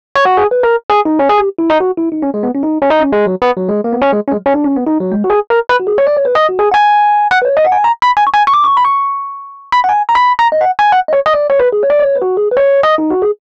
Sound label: Rhodes Solo, Cinematic Funk